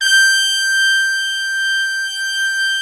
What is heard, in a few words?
BRASS G5, ORCH BRASS; Greytsounds VOL-1